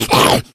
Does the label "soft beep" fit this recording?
No